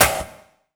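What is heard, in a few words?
snare drum, Drum & Bass, 168 BPM; Ueberschall; Drum & Bass Resonance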